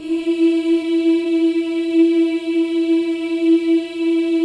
BOYS EES, Symphony of Voices; Spectrasonics

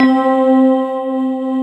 SYN K-2000, Roland

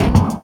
Spitter Hit, Esoterik Beatz; Spitter Kit

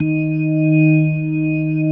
EB3S, B3

